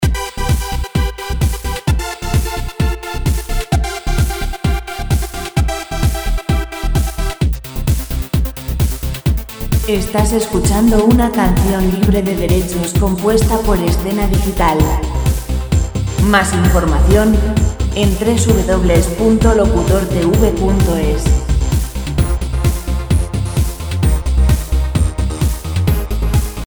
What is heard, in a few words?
130 dance; dance musica libre